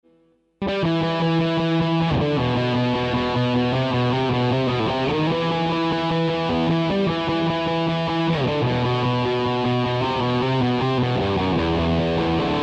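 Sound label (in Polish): riff bez tłumienia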